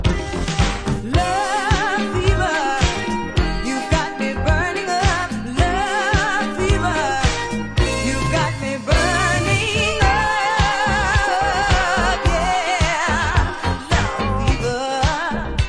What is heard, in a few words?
vocal; disco